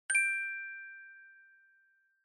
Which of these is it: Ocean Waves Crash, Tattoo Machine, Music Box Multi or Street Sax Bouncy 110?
Music Box Multi